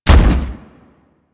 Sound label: Step; Warframe; Corpus; Jackal Redux